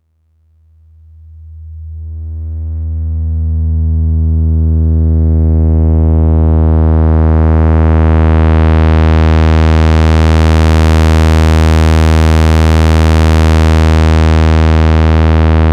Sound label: A110 direct VCA